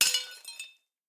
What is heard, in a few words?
monkey Throw; jumanji